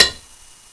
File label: wood